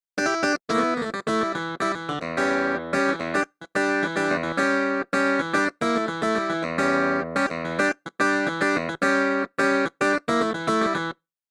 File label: Joes Clav; Classic Keys ROM Option